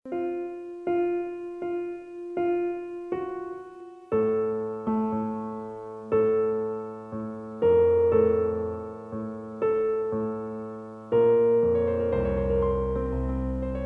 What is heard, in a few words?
Piano